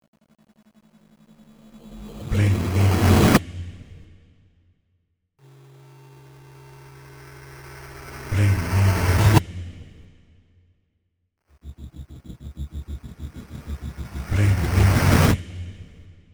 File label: SFX FILM REV